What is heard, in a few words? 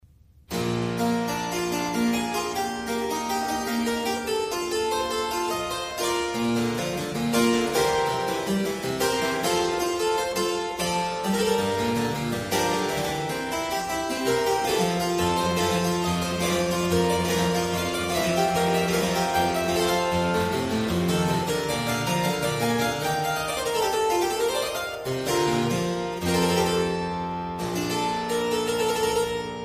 kleine Praludien in C major; Johann Sebastian Bach